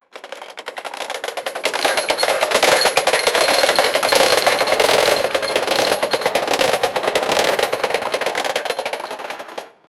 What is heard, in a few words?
rollercoaster chain clack; amusement park